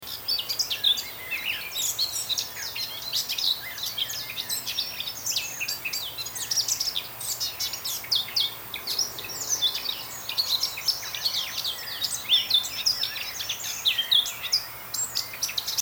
moenchsgrasmuecke

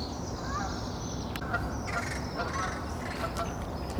rabenkraehe kanadagans

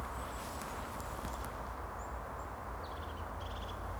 haubenmeise

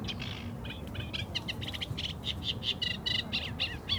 teichrohrsaenger

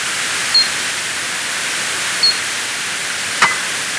zwergfledermaus

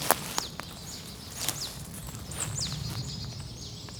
sumpfmeise

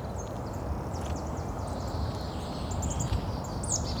sumpfmeise

